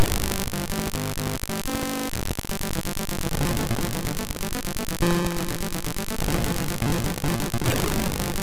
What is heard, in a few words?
Intro, MOS6581R3